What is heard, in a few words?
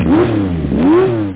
car reving; Amiga Format CD 16